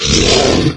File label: evade right solo, wraith; doom3